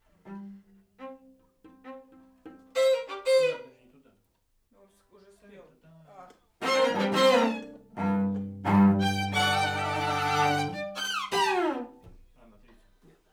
Main Strings, RNR for piano

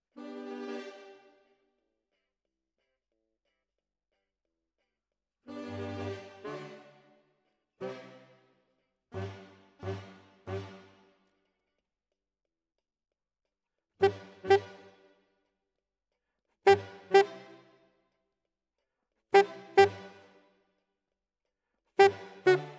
Alto, RNR for piano

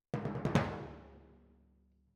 Timp Close

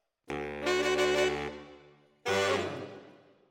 ORTF SCHOEPS, piano

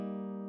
E.GTR Royer

